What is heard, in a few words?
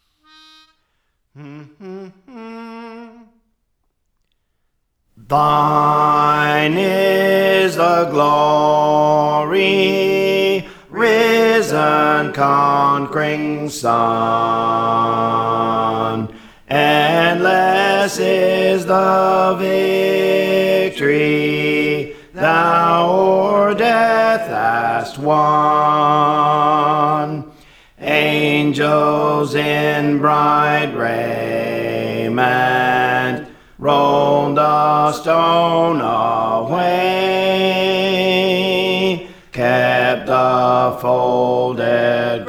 Bass; Thine Is the Glory; Praise For The Lord Training